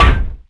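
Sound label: gladiator search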